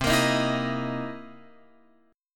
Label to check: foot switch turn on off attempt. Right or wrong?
Wrong